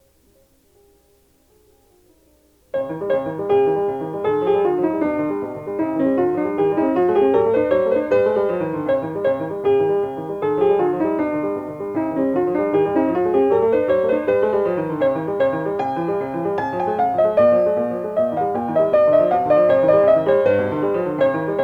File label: Allegro Agitato